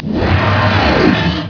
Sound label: morph; mk2